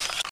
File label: clipin, weapons